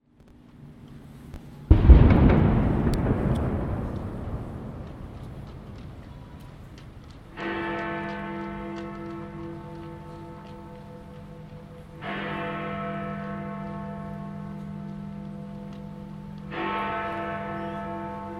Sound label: soundscape from the cenotaph